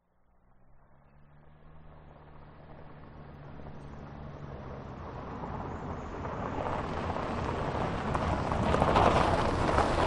transport car suv holden captiva approach turn close off gravel track